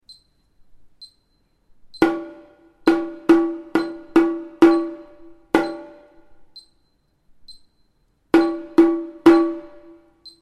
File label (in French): percusion exercice